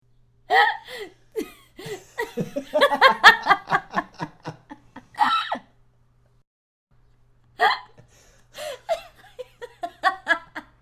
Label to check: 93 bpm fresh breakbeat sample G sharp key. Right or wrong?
Wrong